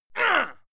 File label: male fight ah; schreie; menschen; soundpool